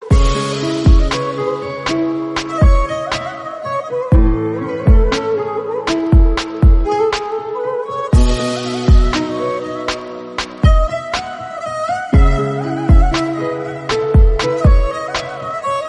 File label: sad turkish violin rap beat instrumental song